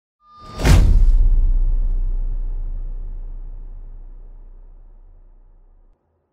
impact

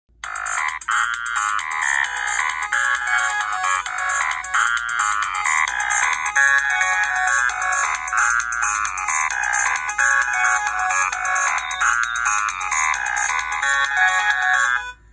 motorola rokr e1

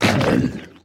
squid death, Minecraft

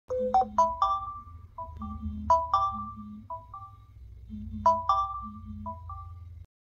galaxy watch fall detection

